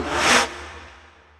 woosh, Percussion Swish

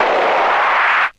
crasher, zaps and beeps

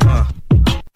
uh boom bap, Drum loops, Kick Snare

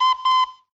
toot toot

